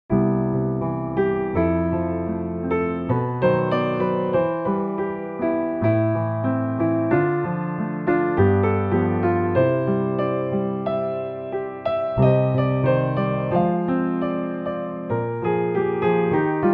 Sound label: Some chaff, J.S.Bach-inspired, Organteq